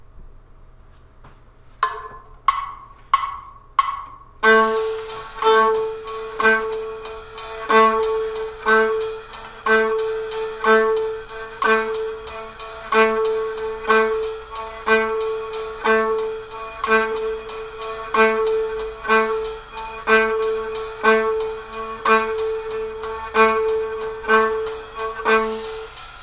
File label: Bossa Ghostnotes